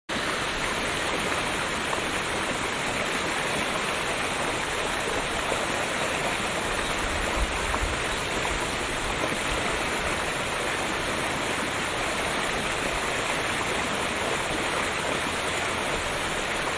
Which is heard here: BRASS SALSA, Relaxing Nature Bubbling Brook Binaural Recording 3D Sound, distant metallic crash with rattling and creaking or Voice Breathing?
Relaxing Nature Bubbling Brook Binaural Recording 3D Sound